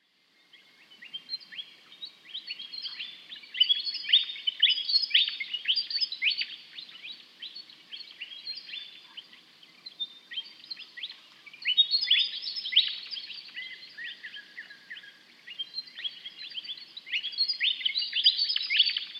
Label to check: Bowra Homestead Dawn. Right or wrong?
Right